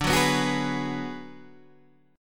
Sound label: D 6th Flat 5th, Guitar DADGAD